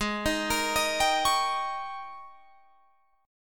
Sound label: G sharp Major 7th Suspended 2nd Suspended 4th Guitar Drop D